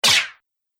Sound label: Multimedia Swipe, transition; Transition Sound Effects